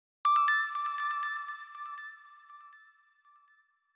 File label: Dings; ActivStyle effects